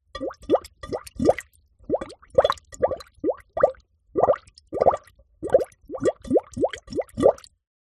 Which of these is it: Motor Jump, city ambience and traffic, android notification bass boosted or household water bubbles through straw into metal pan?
household water bubbles through straw into metal pan